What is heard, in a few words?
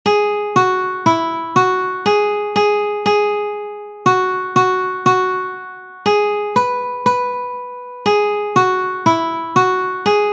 Mary had a little lamb guitar one string